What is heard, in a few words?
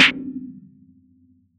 snare space cake